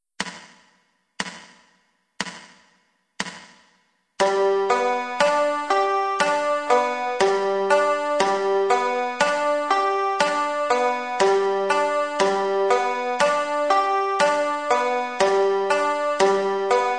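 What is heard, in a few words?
forward reverse, banjo; roll pattens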